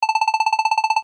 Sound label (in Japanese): 会話